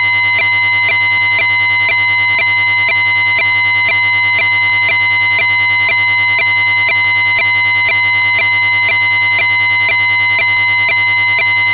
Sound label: fax